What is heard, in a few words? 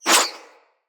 Fire Arrow Whiz By